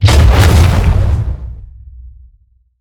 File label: Mortar Cannon Fire; Evolve; Behemoth